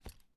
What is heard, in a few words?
jump